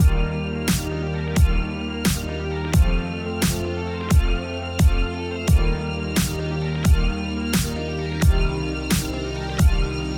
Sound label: Glitch Hop & Electro Mix